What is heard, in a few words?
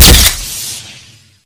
camera flash bass boosted